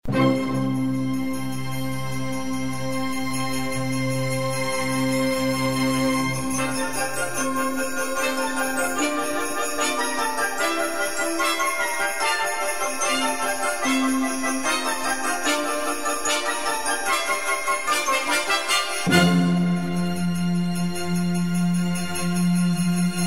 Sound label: Xmas Music Upbeat; Holiday